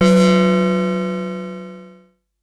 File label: SYNTH